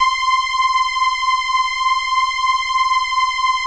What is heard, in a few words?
LEADS; Masterbits; Vintage Voltage